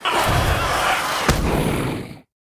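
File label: Wind Wolf death; monster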